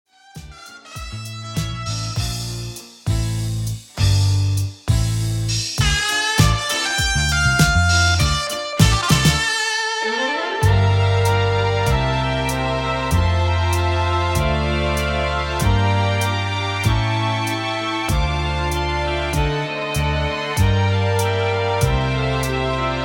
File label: Karaoke